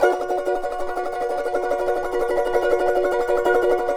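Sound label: charango C minor tremolo; Spectrasonics; Hans Zimmer Guitars VOL-2; CHARANGO CHD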